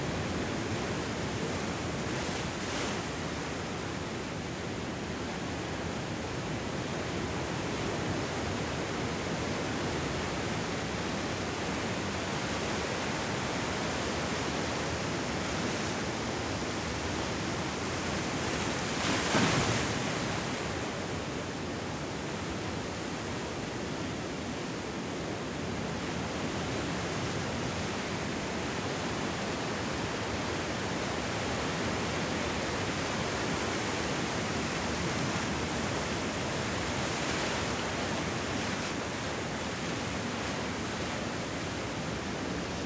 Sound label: beach Loop